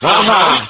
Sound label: "ah ha"; Voice; ooh ahh